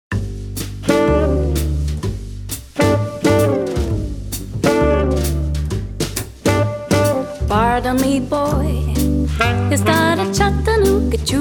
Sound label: Swing Jazz vocal